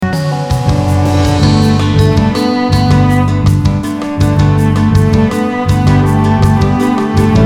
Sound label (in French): Bass drum, La Bass Drum dans l’arrangement; rythme de la samba; poum, pou-poum, pou-poum…